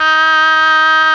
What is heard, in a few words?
OBOE; ILIO; Propeller Island Legendary M400; CHOR+OBOE